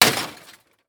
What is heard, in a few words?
Impact Bat Hit Broken Television Plastic